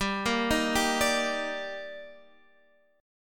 D sharp Major 7th

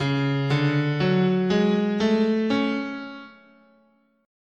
C sharp Japanese, scale

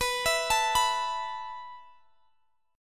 B 7th Suspended 4th B down Guitar Drop D

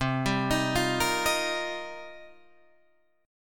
C Minor 11th C Guitar Drop D

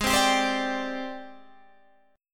G sharp Major Sharp 11th, Guitar Drop D

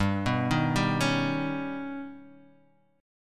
Eb Augmented 7th G

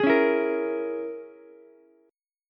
A sharp Minor 7th Flat 5th A sharp, Ukulele; strummed; 13,13,12,13

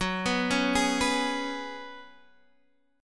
F sharp Suspended 2nd Suspended 4th

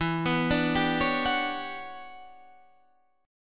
E Minor 13th guitar chord, Half Step Down

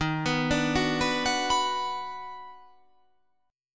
D sharp Minor Major 7th Sharp 5th; x,18,21,19,18,18,20,20; chordshape

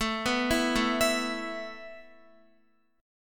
A Suspended 2nd A Guitar; Asus2 arpeggiated

